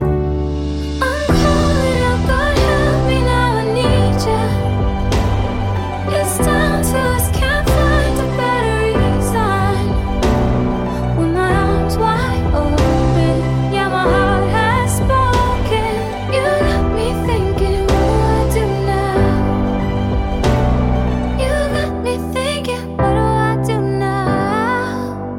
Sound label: Singing